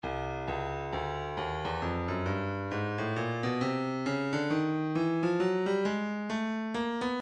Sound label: breakbeat 067 bpm; 065 069 Bpm; Crenshaw A; Stylus Breakbeat Midi Files